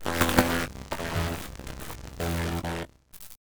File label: shocking grasp; cantrips